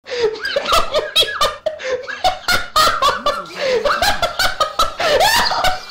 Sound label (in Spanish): risita